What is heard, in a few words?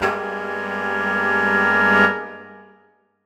Horn Swell Gmajminb6; undercover samples